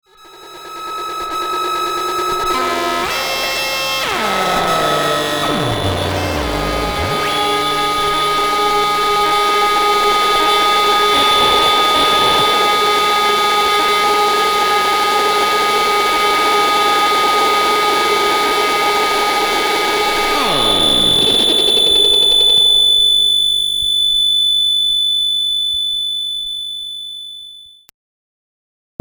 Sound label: Moog Modular